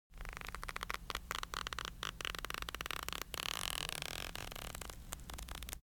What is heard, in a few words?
cloth Stretch; spellbound